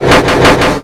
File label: metal Eye Plate Rebuild